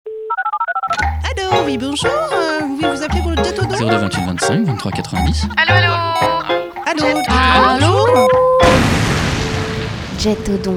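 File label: jingle ambiance Standard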